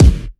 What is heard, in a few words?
Kick Foot; One Shots; Drums; Late Nite